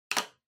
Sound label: Sensu Put